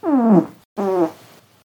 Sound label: loud farts